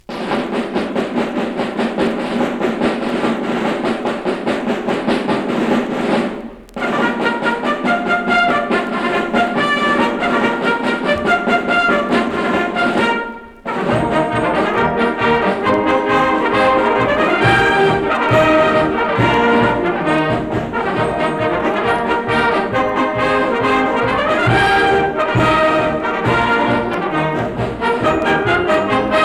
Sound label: Dixie; 1961; Echoes From Florida Field